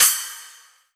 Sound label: Splash Cymbal; Korg N1R